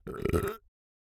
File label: burp; OPENAIR; Anechoic